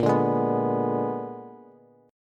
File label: Bb 7th Flat 9th Bb, Bass; Bb7b9; strummed; JGuitar